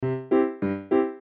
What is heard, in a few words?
Piano March Loop; Cartoon; Musical